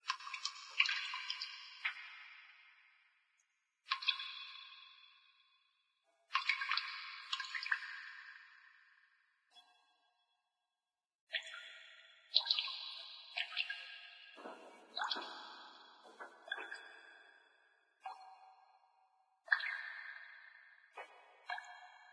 sewer, ambience

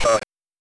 hah

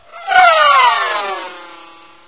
race die

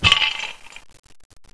glass, debris

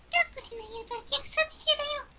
alert; shii

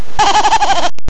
woodpecker

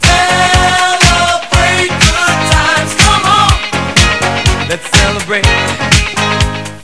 celebrate

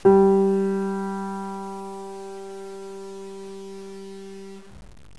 piano g

